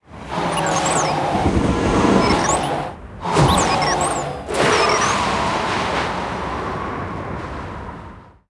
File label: Unlock Cinematic Commercial; subway surfers city sound effects; Android, iOS